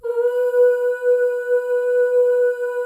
FEM OOS, Symphony of Voices

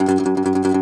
DOMRA, Segundo Domra, Plucked Strings

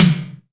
SUPER, Drum Mashines, SR-16 DRUMS; EdgeSounds

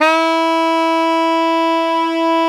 SAX E2, SAXOPHONE; USB Soundscan vol.63; Balkanish Traditions; AKAI

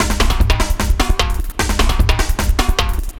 LOOP, 150; Masterbits - Tekkno Trax; D LOOPS

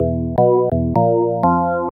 ORGN LICK, K E Y S

